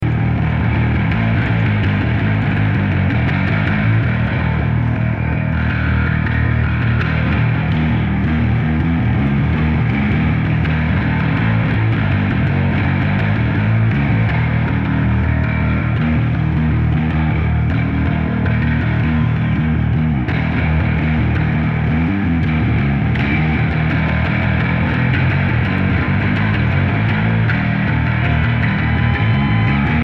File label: Bass Solo